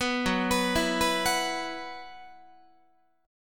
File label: F sharp 7th Suspended 4th, Guitar; arpeggiated; F#7sus4; 4A,4E,5A,5D,5G#,6C#